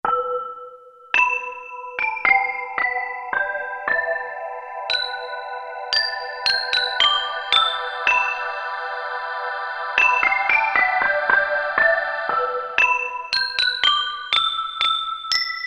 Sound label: Bell, Roland U-220; Factory Patches